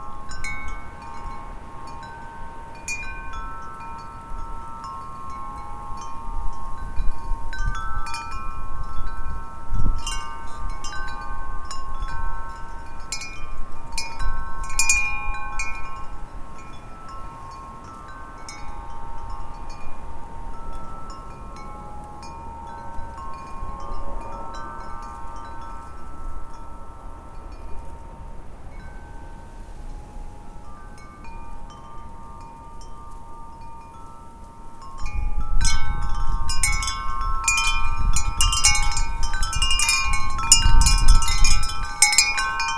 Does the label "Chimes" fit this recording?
Yes